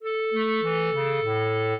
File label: clarinet; Mozart